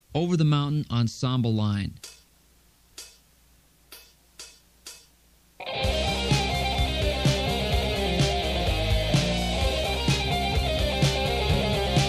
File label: ensemble line, guitar